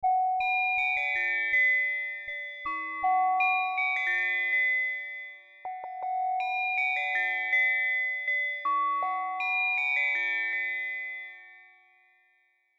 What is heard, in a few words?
Xylo Riff